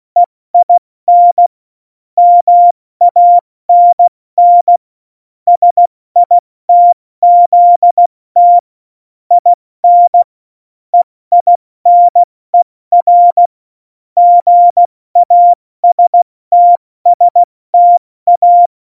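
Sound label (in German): Witz, cw morsen; Morsekurs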